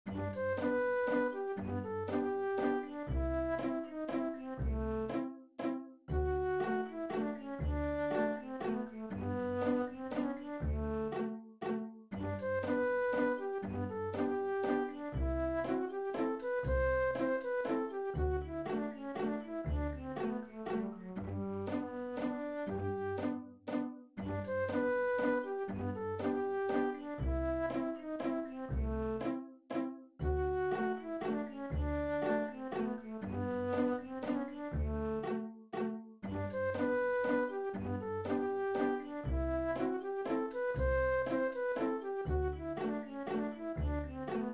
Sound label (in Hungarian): G-dur intro fuvola kvartettre